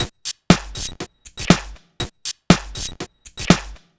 Minimal, 120 BPM, Percussion; Sonic Foundry (Sony Creative Software); Underground UK House & Electro

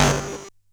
Bent Tom

